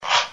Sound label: change, weapons